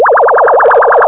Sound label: ufo